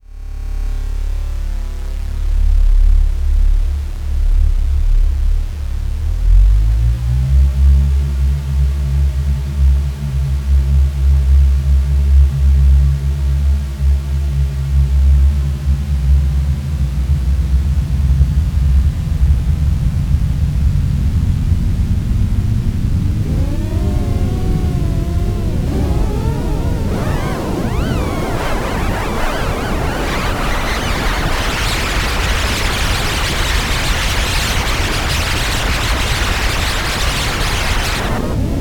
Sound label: sq sin mix